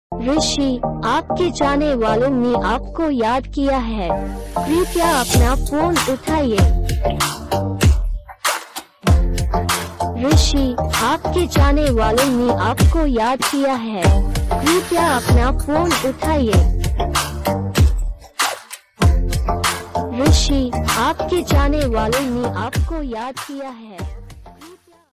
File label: name ringtone; rishi